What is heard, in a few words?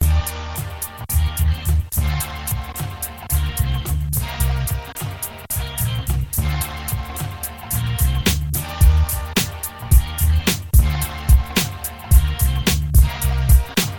beat